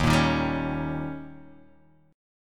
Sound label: D sharp 7th D sharp, Guitar Open G